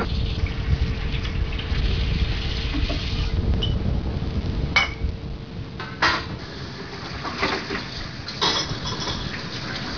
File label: sculpture tower sound; sally mankus